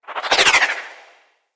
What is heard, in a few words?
Arch Wing Dodge Roll Left; Vehicles; Movement